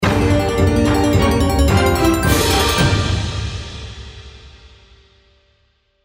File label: win big; Crystal Furnace